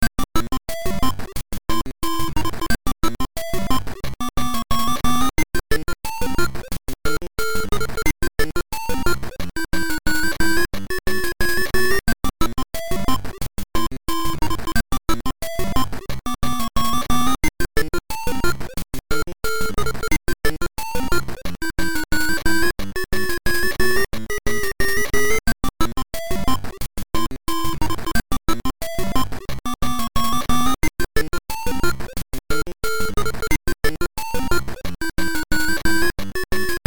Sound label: High Score Display; pinball; HAL Sound Team